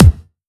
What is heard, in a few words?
normal hit whistle